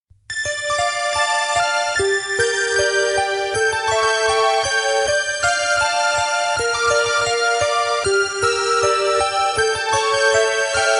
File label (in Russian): orkestr dozhdik plyus